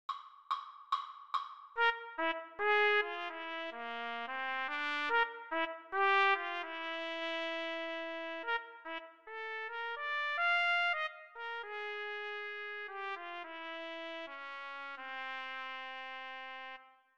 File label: melodic error detection